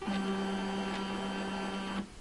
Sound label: scanning; Machines